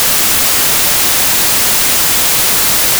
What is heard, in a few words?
noise